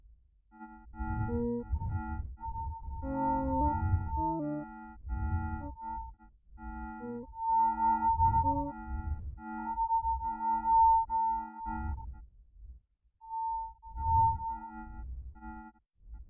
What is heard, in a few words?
sonification example